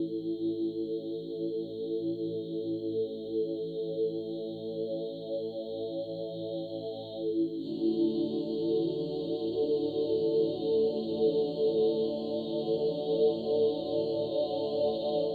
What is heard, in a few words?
SYNTHPAD ETHNO 125 A; eJay; Sphere